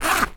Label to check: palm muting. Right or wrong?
Wrong